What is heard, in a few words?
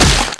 bust flesh; svencoop; custom weapons; biorifle